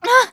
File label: hurt